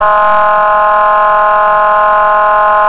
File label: Whelen Air Horn